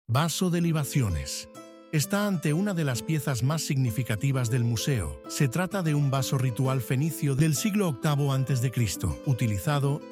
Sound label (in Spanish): audioguia música